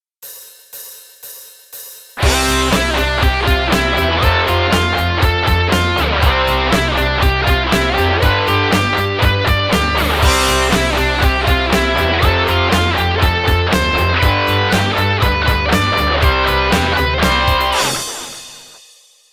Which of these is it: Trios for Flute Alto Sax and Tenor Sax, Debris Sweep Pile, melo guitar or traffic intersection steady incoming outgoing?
melo guitar